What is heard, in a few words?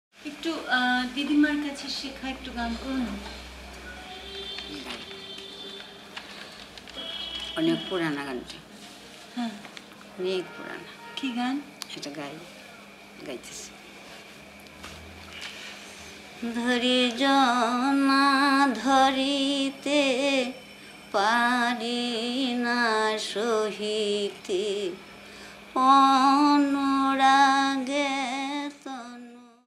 Song